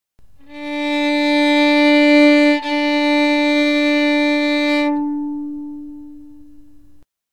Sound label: Violin open string